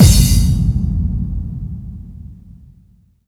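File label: bass drum FX, dance drums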